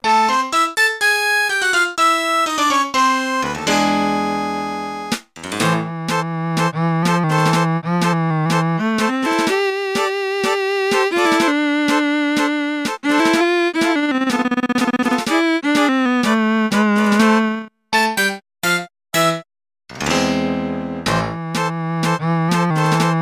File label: Tango (Aircheck); animal crossing full gamerip